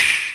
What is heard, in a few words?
nintendo switch snap